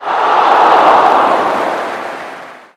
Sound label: audience dis; retro-bowl